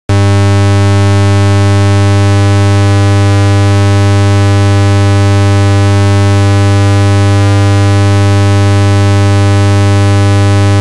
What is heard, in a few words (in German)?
Energiesparlampe